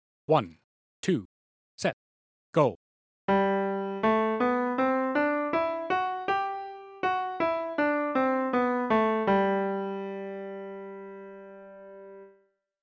D 1 octave 80bpm, one octave scales

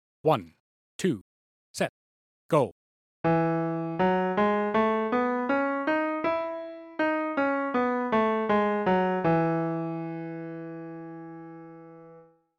B 1 octave 80bpm, one octave scales

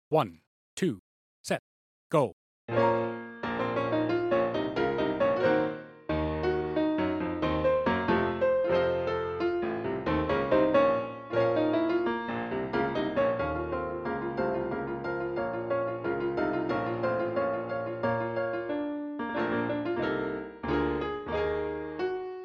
Nocturno 90 bpm; F Strauss; tmea solos

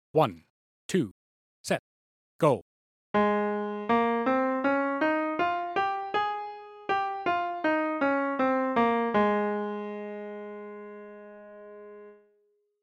Eb 1 octave 80bpm, one octave scales

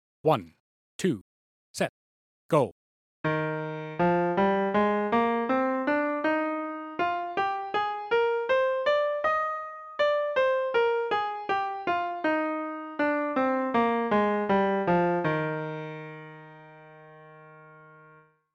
Bb 2 octave high 80bpm, two octave scales

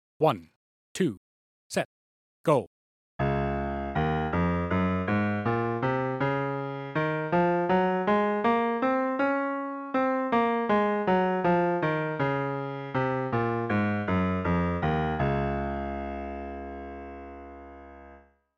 Ab 2 octave low 80bpm

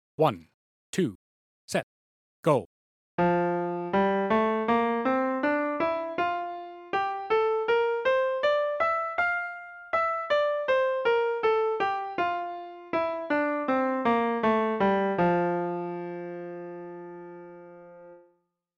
C 2 octave high 80bpm, two octave scales

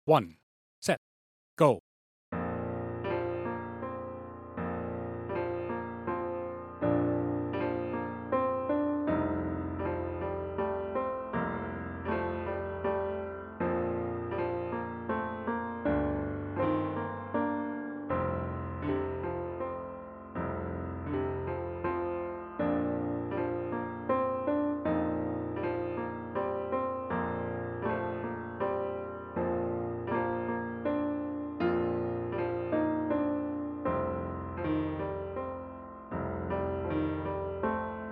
muted section 80bpm; tmea solos

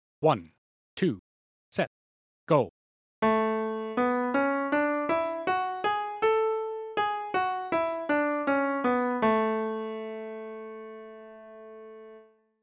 E 1 octave 80bpm, one octave scales

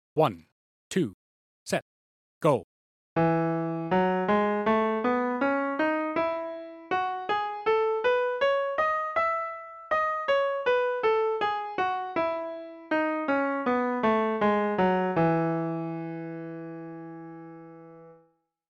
B 2 octave high 80bpm, two octave scales

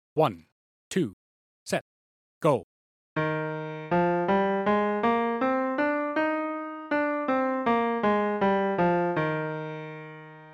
Bb 1 octave 80bpm, one octave scales

